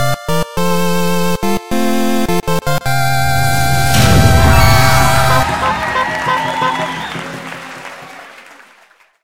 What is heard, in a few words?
Jingle Title logo; Dandy Dungeon Legend of Brave Yamada